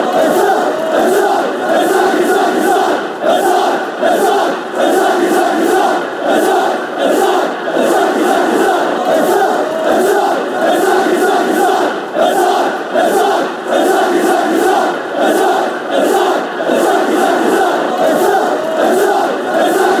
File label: attack attack attack, fanchants